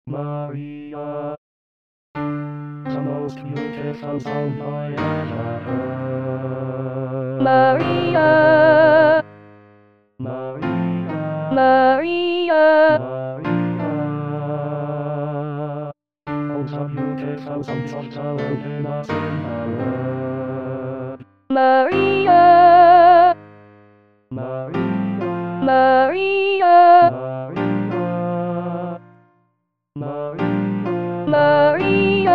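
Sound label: Soprano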